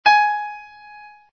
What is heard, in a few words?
gis2 as2, keyboard sounds